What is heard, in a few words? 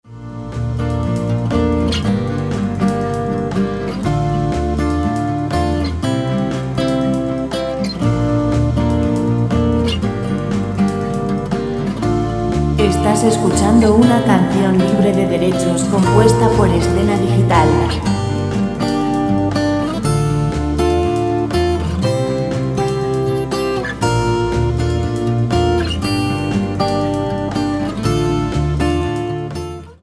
120 guitar lent pop; guitarra musica libre